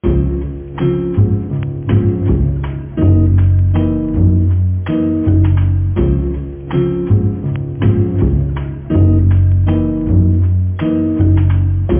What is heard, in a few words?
loop, pause elevator music; pauselock; nova